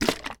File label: food drink lemon juice shake short; zapsplat